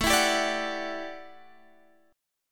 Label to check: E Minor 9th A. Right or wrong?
Right